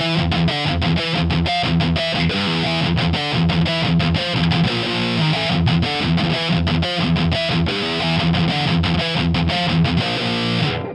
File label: line6 pod xt; j-800